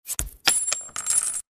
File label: buy